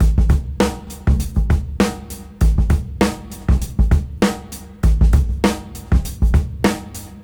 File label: Swing 99 BPM; AMG - Keith LeBlanc Phat Butt Jams